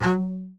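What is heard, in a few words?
F#3 SPICCATO, BASS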